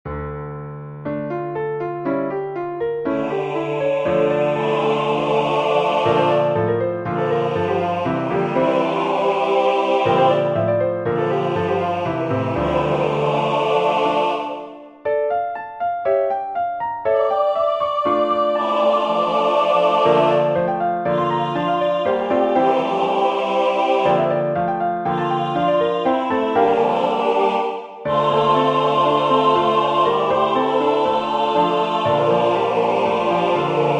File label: vocalise satb pno